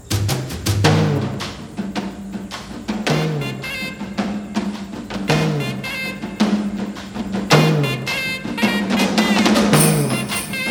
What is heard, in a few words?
Phone Call BGM; Phone Call To Vaembu